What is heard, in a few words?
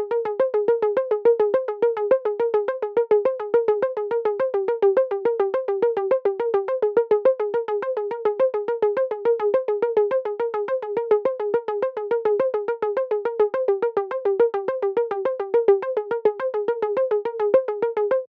Arp F-105 Dry